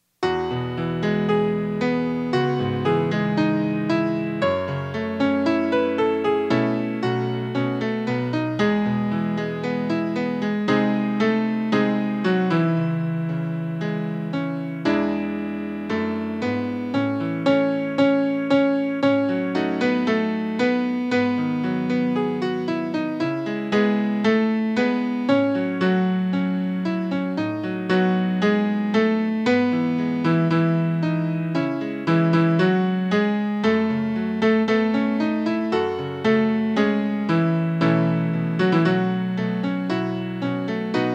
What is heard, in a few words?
Covenant Prayer Piano